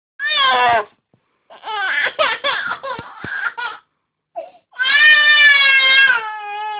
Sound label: baby hungry, f